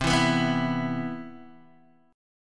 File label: C Major 7th, Guitar DADGAD